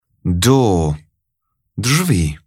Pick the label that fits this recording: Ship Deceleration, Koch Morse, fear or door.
door